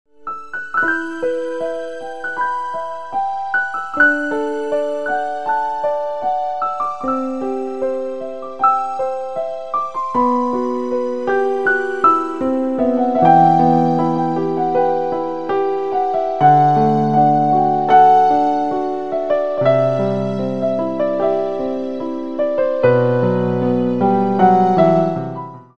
Piano Instrumental Ringtone

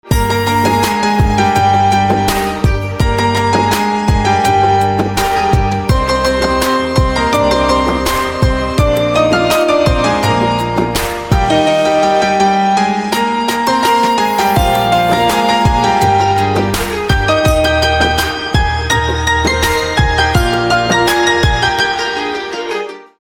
Piano Instrumental Ringtone

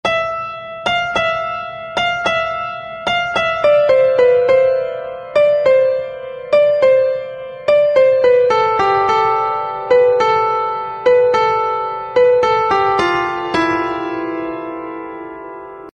Alarm Tone

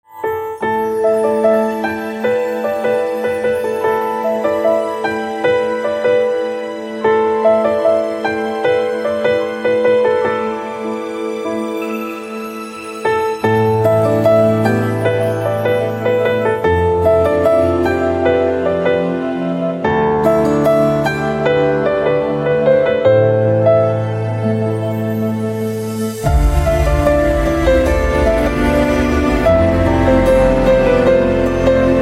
Piano Instrumental Ringtone

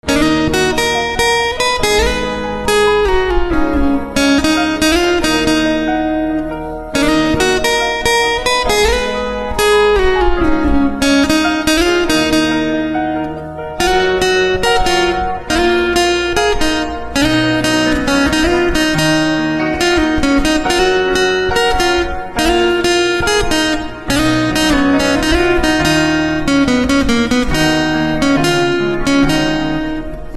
Guitar Instrumental Ringtone

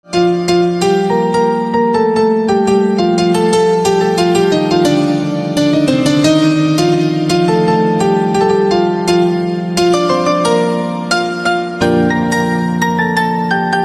Piano Instrumental Ringtone

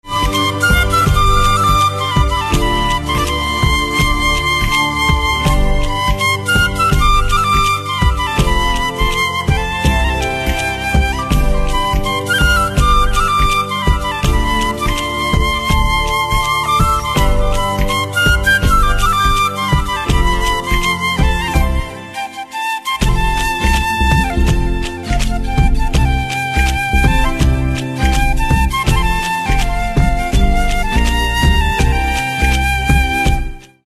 Flute

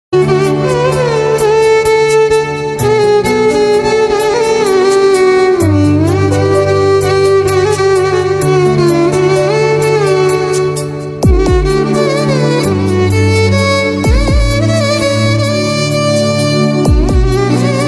Violin

